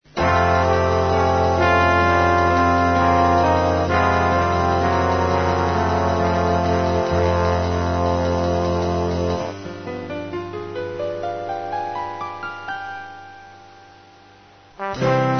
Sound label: trombones